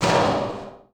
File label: Clangers; Total Drum&Bass; 90 s Sample CDs; Hits & Bits